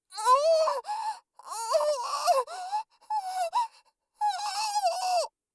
口枷; 効果音なし

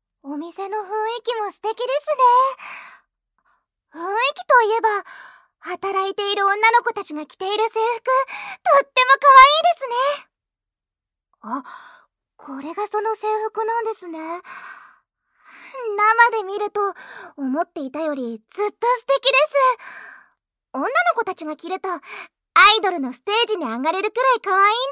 自由を奪われて催眠フェラ 全身舐め ディープキス、乳首舐め、フェラ; 完オチ催眠！ ～催眠ベルを鳴らせばアイドルＪＫかすみちゃんの処女もいただけましたｗｗｗ～; SE無し

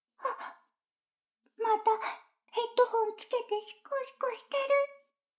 シコシコ音声; 逆時間停止されて

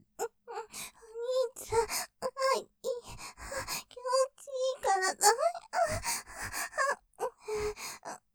喘ぎ◆お兄ちゃん、気持ちいいからぁ、あぁ；; ステレオ

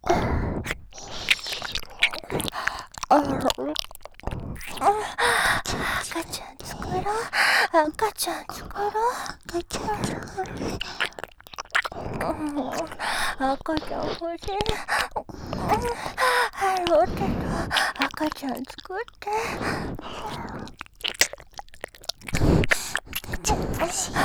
赤ちゃん作ろ♪ 耳舐め喘ぎ; 静華

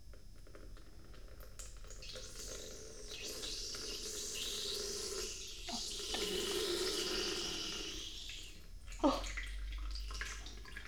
おしっこ 室内 ステレオ; おしっこのみと編集版

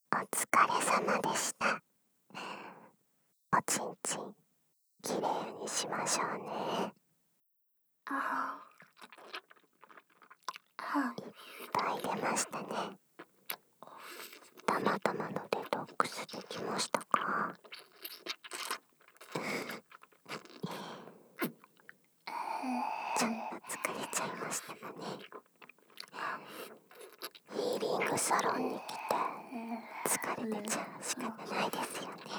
フェラ音 大 カウントダウンあり; アレンジトラック

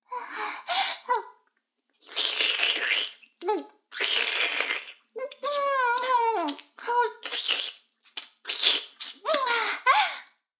フェラ音声; 逆時間停止されて

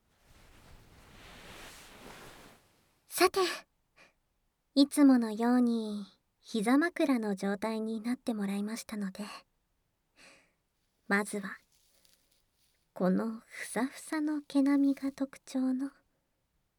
ブラシで耳かき(右)

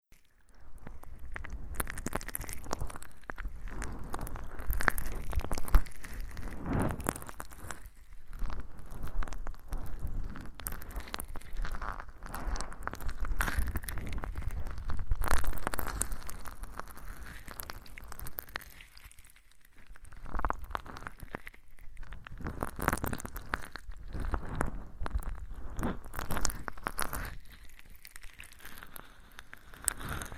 タオルで体を拭き拭き

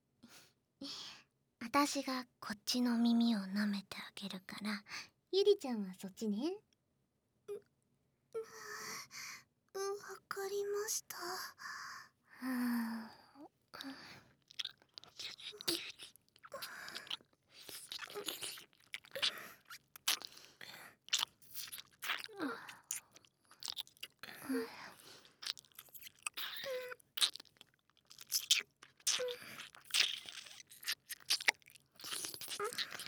両耳舐め, ASMR; アキとゆりと両耳舐め

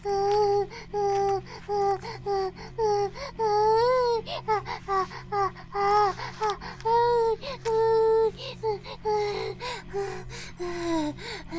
バイブ放置; イクたびにわけて

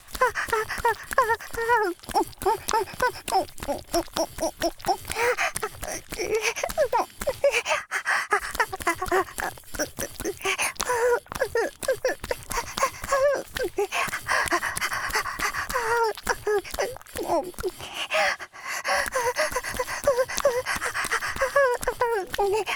ギシアンループ; 田舎の幼馴染のAV出演を手伝って…

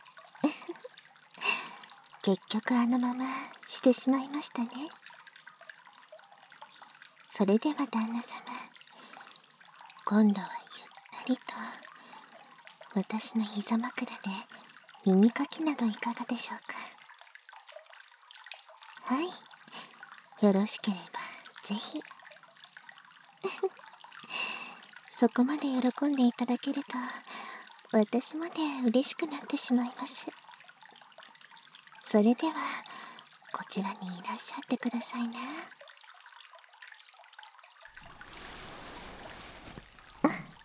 お風呂上がりの耳かき（バイノーラルマイク収録 効果音あり）

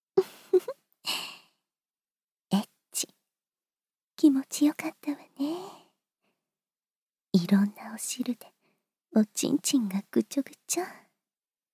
お掃除フェラ・通常射精; 効果音あり; 非カウントダウン差分

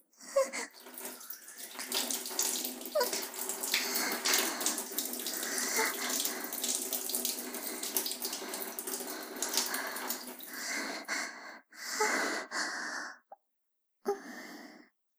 おしっこ, お風呂場; おしっこのみと編集版; モノラル

